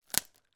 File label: office parcel tape rip with teeth